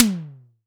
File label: E TOM; Best Service; Alpine Volksmusik